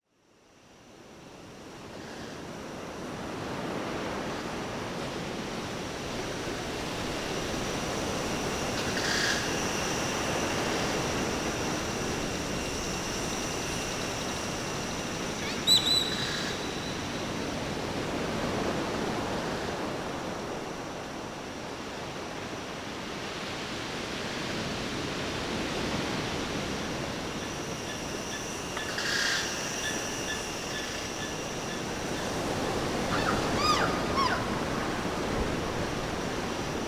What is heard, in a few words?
pirates ambience; Worms 3D